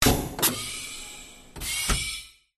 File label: tek science fiction spacecraft or vehicle door open; audio mangler